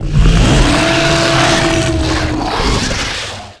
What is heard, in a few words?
werewolf; animal; Tony Hawk's Project 8; XBOX